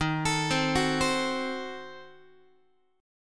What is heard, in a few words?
Eb Diminished 7th Eb, Guitar; Ebdim7 arpeggiated; x,11,12,10,10,11; JGuitar